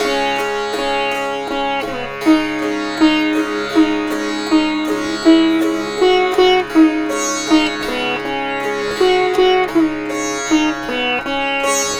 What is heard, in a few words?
Sitar, East Indian